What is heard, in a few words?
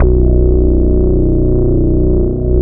Bass Analog & Synth; USB Soundscan vol.12; AKAI